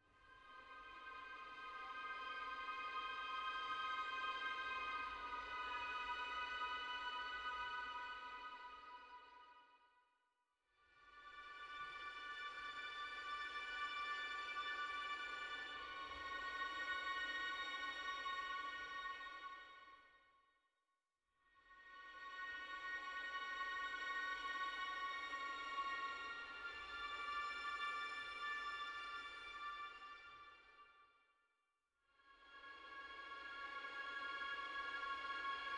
amb well end no perc; vendirens; Tyranny Gold Edition

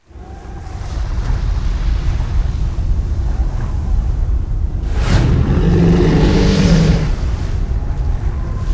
ordos lose, soundtrack; Dune 2000

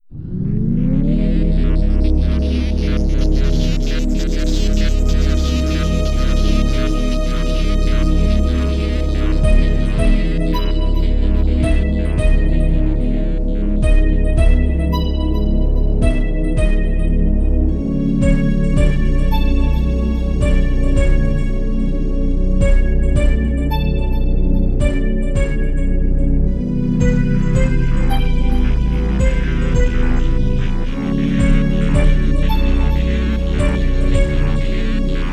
Looping Steps; super spamton 64

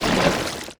dung defender emerge

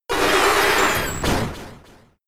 Roar; pokemon sfx gen 7 attack moves sumo usum

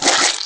squirt

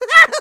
laugh success; Scrat

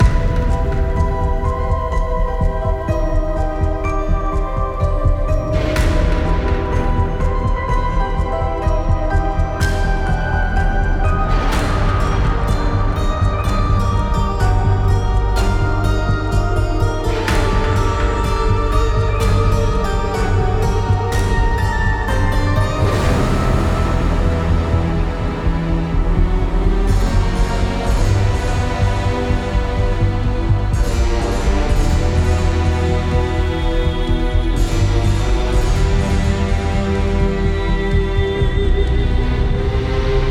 Lobby Music Loop; Fortnitemares

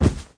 Bed

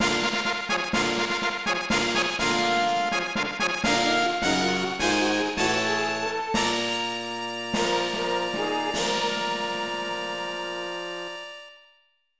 Fanfare

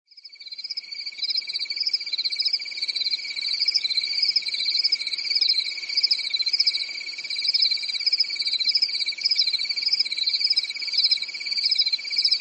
crickets chirping at night; Children of Morta

